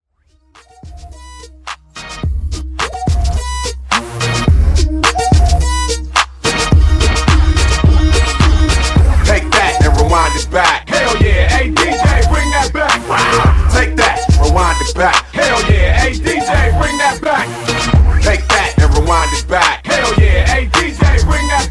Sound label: Party Intro 107Bpm